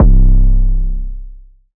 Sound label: 808 recoil C, Tonal; Hip-Hop; Slate; CAICOS Hip-Hop Sample Pack